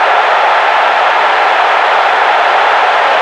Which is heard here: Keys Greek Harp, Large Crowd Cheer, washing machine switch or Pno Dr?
Large Crowd Cheer